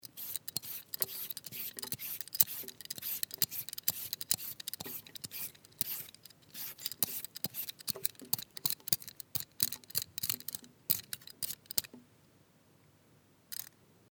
Biokartoffel schealen

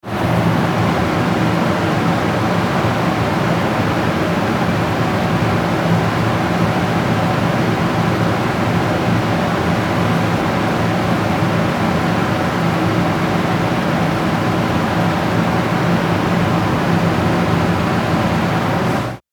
Entlueftungsanlage

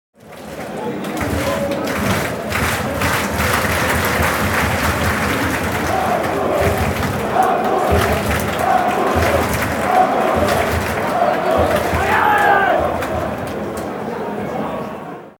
Stadion unter der Tribuene

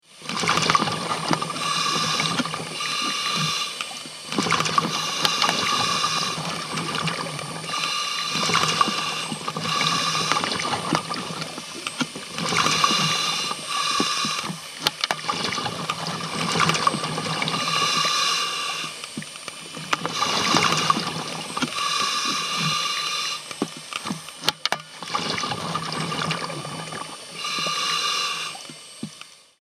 unter Wasser Atemluft

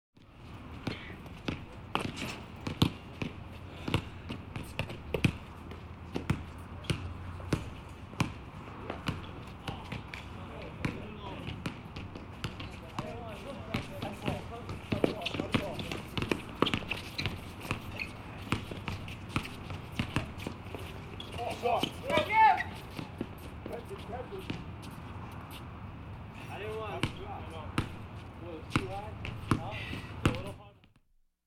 Sport Basketballspiel mit Dribbling

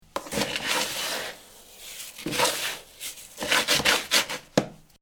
katzenklo

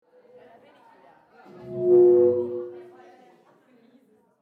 Publikum Reaktion

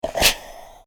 home buegeln dampf vorn

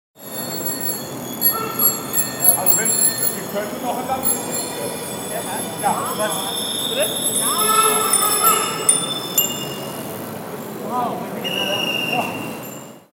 Stimmen und Hupe

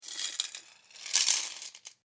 Sparschwein

